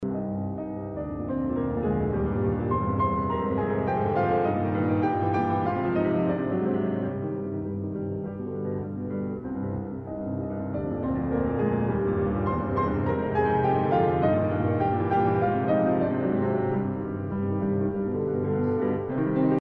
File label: piano sonata in f minor 4th movement prestissimo; l.v.beethoven